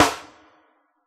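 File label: rock kit snare; Giga Samples Collection; Dr 202 Kit 1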